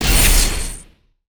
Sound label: wand